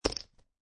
Poker Chips Dropping On Playing Cards; Poker, gambling, chip

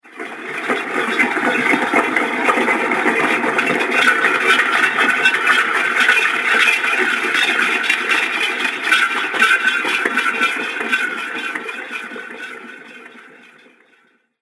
Goblin War Machine, machinery sound effect; Fantasy Sound Effect

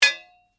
Blade Heavy Moving Impact, Sword; Foley sounds for melee weapons; Combat

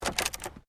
WOOD PLANK TWIST

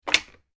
household telephone old reciever lift; Telephone ( Old Rotary Style ) Receiver Lift; Electronics; Telephones; McKinney Sound

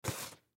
Bottle Water Empty, Bottle Water Empty Pick Up; Bottle Water Empty Pick Up Carpet; Pick Up Sound Effects; FOLEY

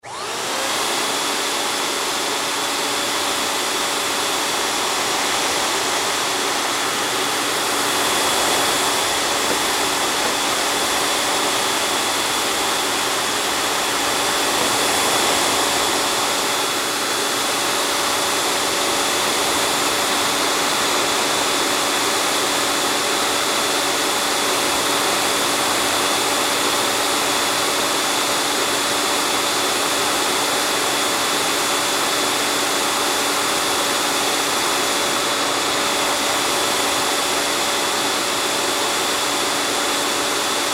Sand Blaster, Sand Blaster On Run Off; Sanders; INDUSTRY

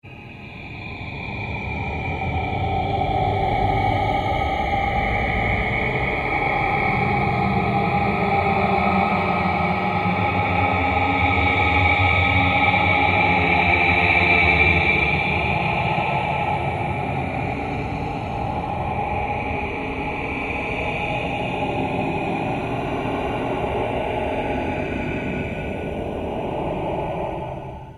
Ambient Drone Slow Sweep

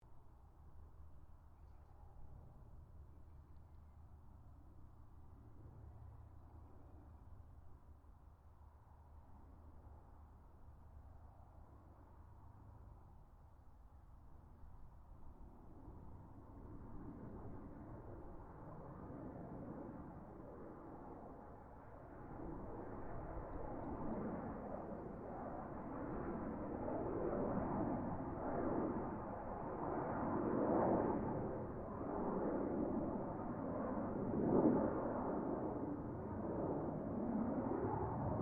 AIRCRAFT GLOSTER METEOR F MK8 PASS DISTANT; Gloster Meteor figter jet; Airplanes (Sound Effects:Vehicles)

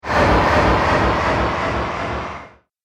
Sturdy Sweep High Delay, Whoosh Sound Effects

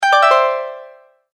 multimedia tone alert

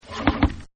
THUMP Button; Buttons; Multimedia

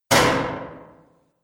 Exploding Bang, bomb sound effect; Bomb Sound Effects; Explosion; Bombastic Booms And Battle Ambience

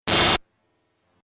Static Glitch; sound design

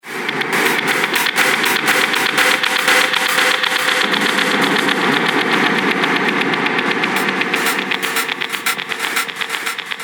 Dark Horror Hits; impact; Miscellaneous Impact Sound Effects; Impact / Explosion Sound Effect; Andrew Fleming

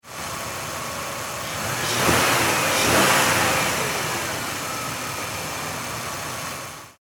Car Mercury Sable Double Rev; Car 2002 Mercury Sable Double Rev; Car Sound Effects; Cars (Sound Effects:Vehicles)

